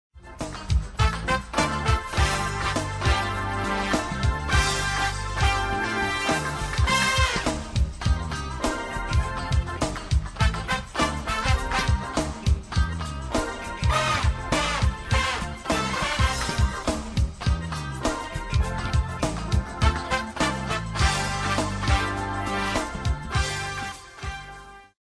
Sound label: pop rock